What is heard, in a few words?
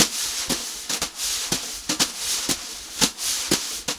120 JZ BEAT, Brushed Drum Loops; USB Soundscan vol.39; AKAI; JAZZY